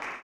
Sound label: CLAP, blues & soul; ILIO; Vocal Planet